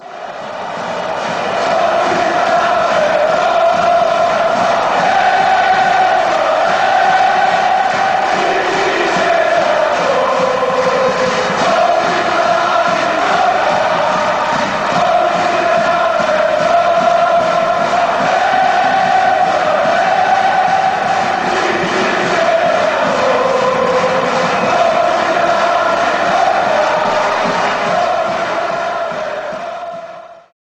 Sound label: fan chants